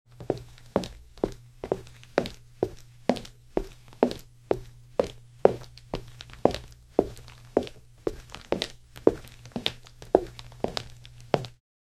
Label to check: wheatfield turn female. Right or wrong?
Wrong